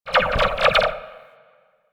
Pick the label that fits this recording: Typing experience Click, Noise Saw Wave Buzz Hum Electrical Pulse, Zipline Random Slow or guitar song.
Zipline Random Slow